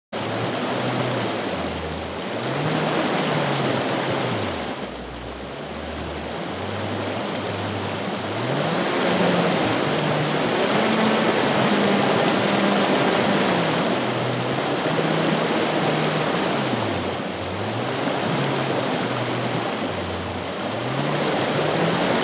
AMOC Water Flow Sonification no Axis Labels

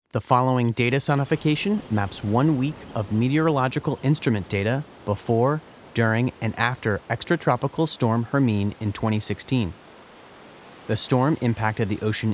Storm Hermine Audio Display ALL Data Sonification

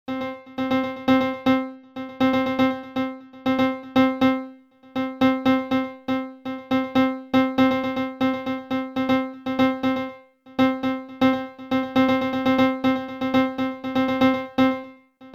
param amp 0-127 normalized

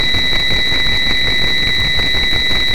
venice lagoon tides

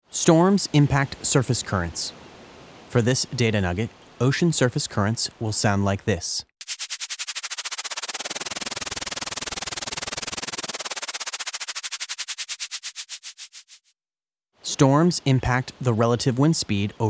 Storm Audio Display intro sounds Currents Wind; Storm Hermine Audio Display

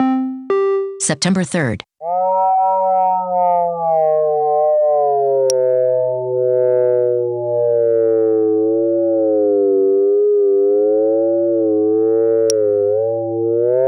temp sonification; Hermine Update

